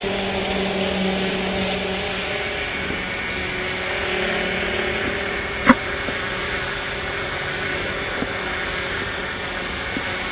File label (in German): heli